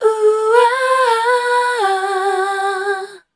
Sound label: MEL A R, Voice Spectral; Best Service; Voice Spectral II